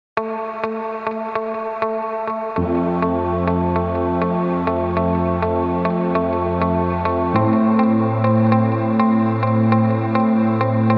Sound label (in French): accords